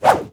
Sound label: katana swing